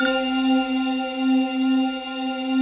METALITES, pads; Pro-Rec - Synthsonic

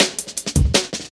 kicker cut, drum loops; Zero-G Jungle Warfare 1, 2 & 3

